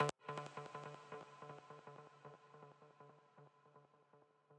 arp

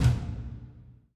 drums

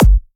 Dr Kick

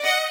violin

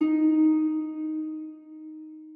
harp

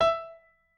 piano

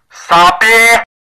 sabi, 喇叭

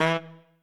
Trumpet melo

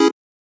Chord

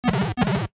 pill dokudoku; 主播女孩重度依赖

